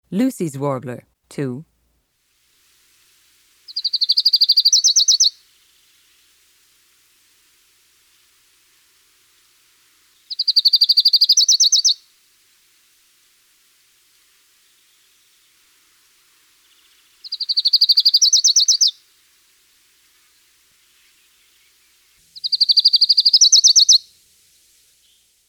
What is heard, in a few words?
lucys warbler song